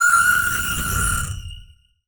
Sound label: Title Transition Ghostly; Production Element